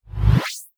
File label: fast small sweep transition; mixkit